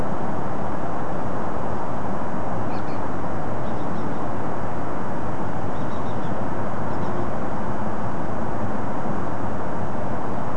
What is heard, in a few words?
Winter thrush sp series, nocturnal flight call; Beijing nocturnal flight call library